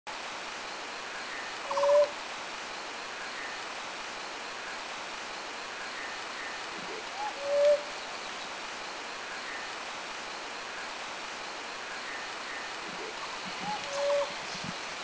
Maroon Oriole Huisun; Maroon [Red] Oriole Oriolus traillii ardens; HuisunForest Reserve; Taiwan; 2 males and a female at HuisunForest Reserve

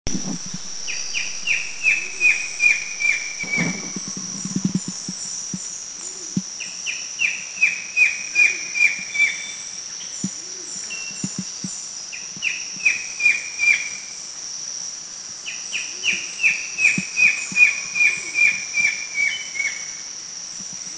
Rufous-lored Kingfisher, Philippines

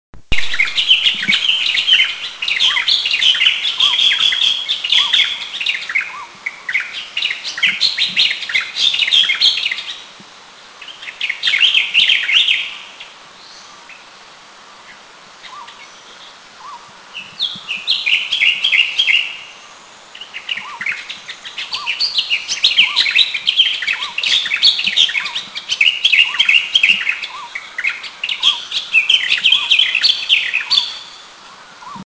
Bronzed Drongo, Eaglenest, India

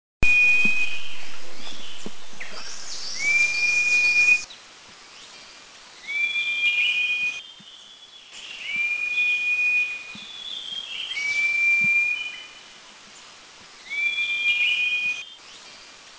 Purple Cochoa, Eaglenest, India; song

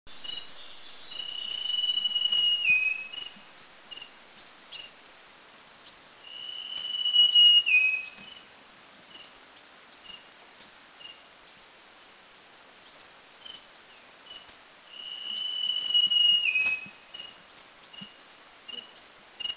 Dark-backed Sibia Song, Doi Lang; Thailand

